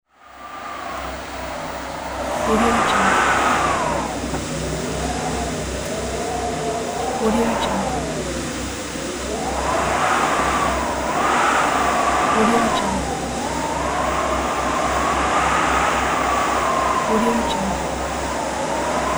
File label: wind howling; صدای زوزه ی باد; nature sounds